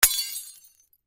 Crash Glass Bulb Christmas Break Drop; Sound Effects:Seasonal; Blastwave

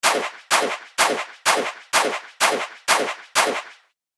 Horror Strange Shooting Contraption; Evil Sound Effects

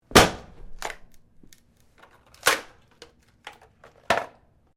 Skateboard Snap Break; Skateboard sounds; Skateboarding; Sports

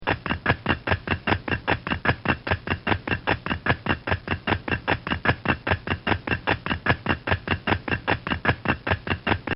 Cartoon Sound Effects Gallops; Cartoon Sound Design

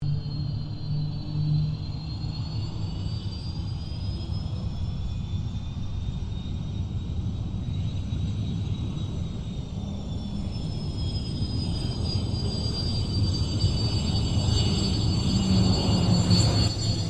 AIRCRAFT CA-27 SABRE TAXI PC-9 PASS; CA-27/F-86 Sabre; Airplanes; Vehicles

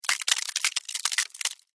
Weather Ice Crack; Snow

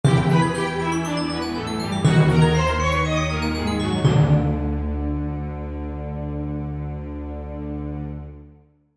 Accent Orchestral, Accent Orchestral Dramatic; Accent Orchestral Glockenspiel Dramatic; Blastwave

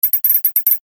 Digital Blip Signals Text Appears Phrase; Digital Clicks, Blips & Alerts

